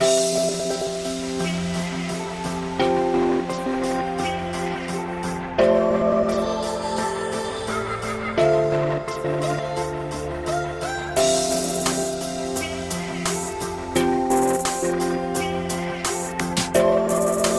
Cute Lofi

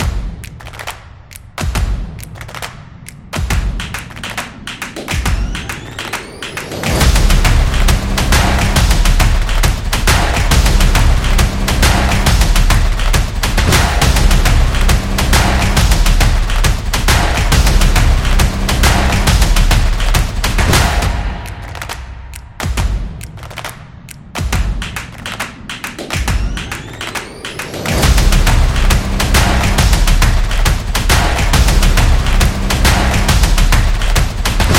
Upbeat Stomps

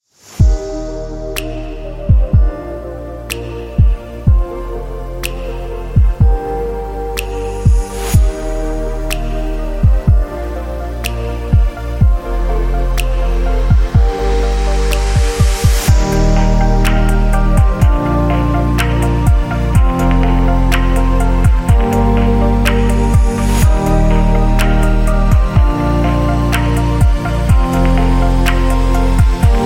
Ambient Atmospheric Music; atmosphere music

